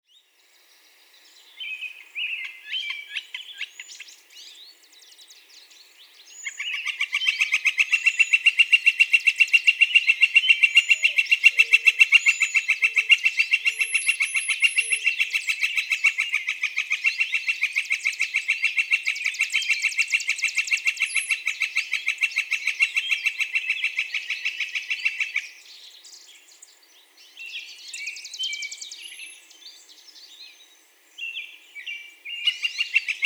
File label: Northern Flicker